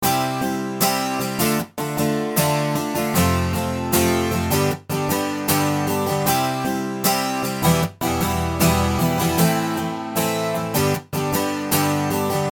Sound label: Ac Guitar Pultec EQ